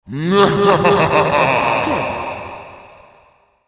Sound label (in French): rire malefique